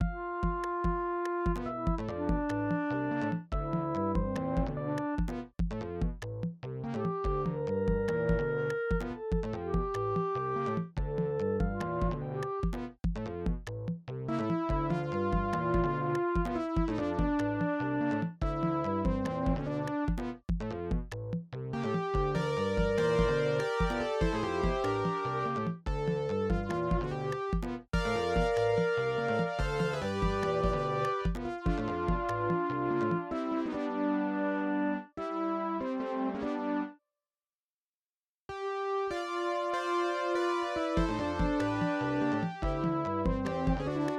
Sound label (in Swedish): kort loop